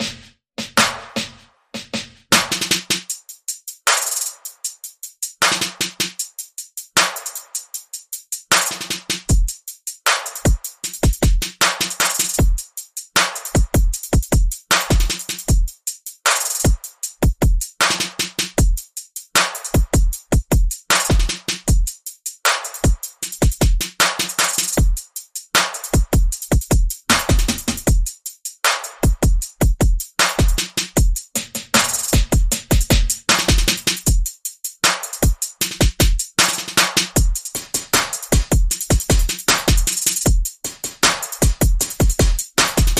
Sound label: Perc and FX Only